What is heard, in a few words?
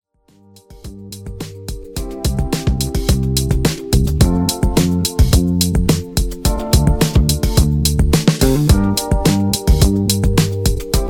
Re Drum 107Bpm